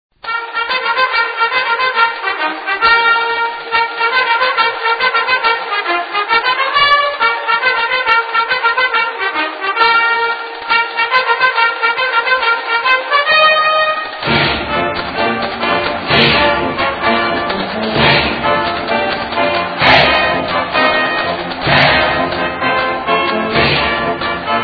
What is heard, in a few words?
Fight Song Chant; Louisiana Tech Bulldogs; Techster; NCAAF Football